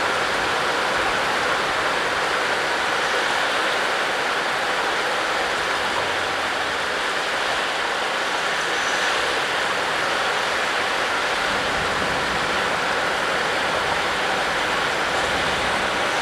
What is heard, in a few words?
rain and thunder; Nature